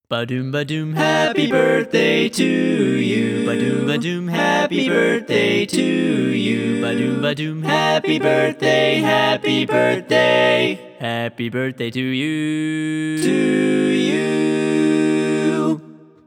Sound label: Happy Birthday Song in English Barbershop